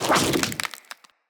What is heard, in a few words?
Spider Leg Swipe; Foley; Root Spider Mother